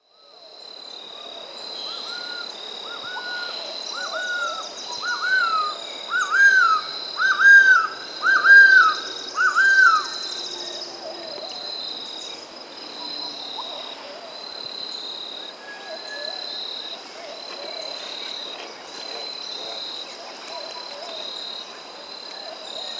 Golden-Headed Quetzal (Pharomachrus Auriceps), Andean Birds; S. America